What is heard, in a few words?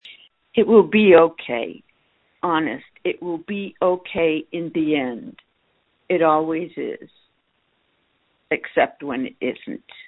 Scream